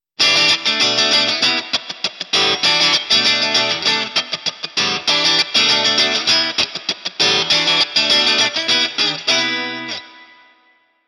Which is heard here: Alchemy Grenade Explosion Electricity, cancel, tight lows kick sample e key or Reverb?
Reverb